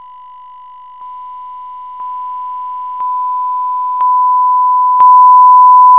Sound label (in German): lauter